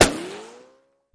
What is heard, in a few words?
Bullet Ricco Single